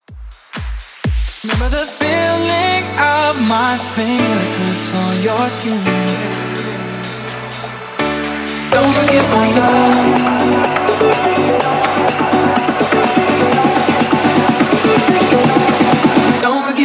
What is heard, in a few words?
Dance House 125; Redrum